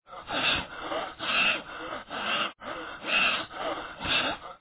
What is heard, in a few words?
out of breath, heavy breathing